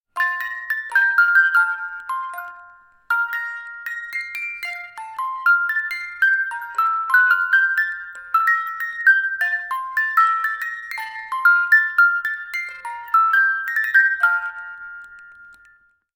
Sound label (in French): On m'a offert une boîte à musique avec une fausse note